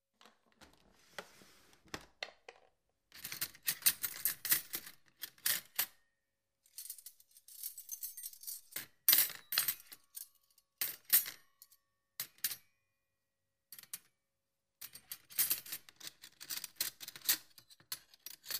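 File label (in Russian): столовые приборы раскладывают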